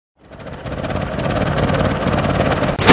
PANNE; MOBICLIC150

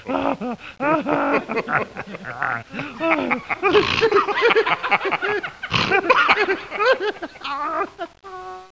rire; iWave Interactive 01

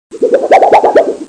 attaque

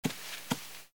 pas tapis

